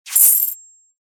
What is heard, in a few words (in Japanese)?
購入や売却